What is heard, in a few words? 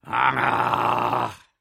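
grumbling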